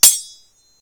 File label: sword clash; RPG Creation Kit; RPG Sounds Pack 4